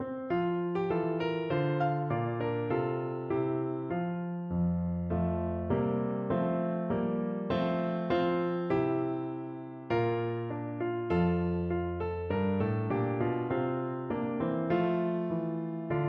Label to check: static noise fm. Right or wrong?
Wrong